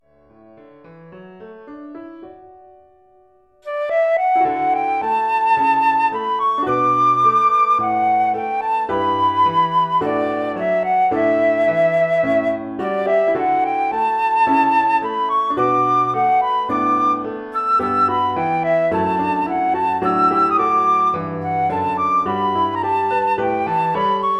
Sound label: flute solo + PA